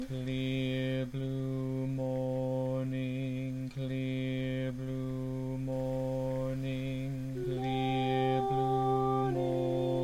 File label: descant soprano, peace choir